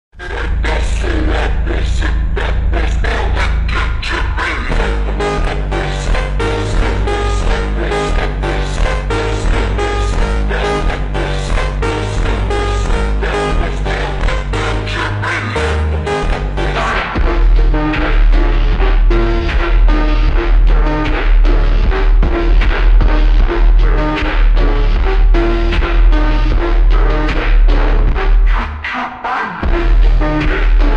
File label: slowed and reverb